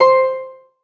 lyre

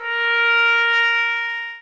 sax

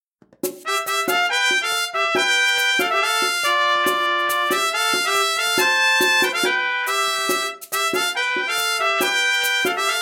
mex trumpet

